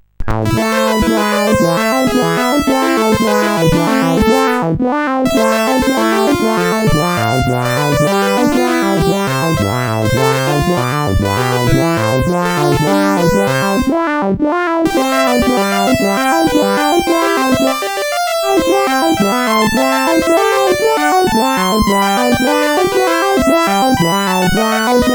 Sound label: Intro, CSG8580R5; Darkstar